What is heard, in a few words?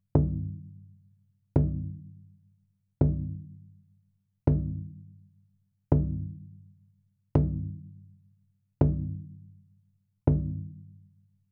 Big Drum hands; Little One (Teetich)